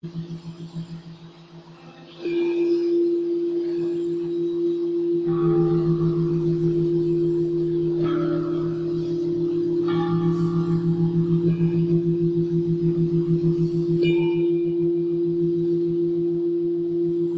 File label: large dark wind chime; Klaus Weber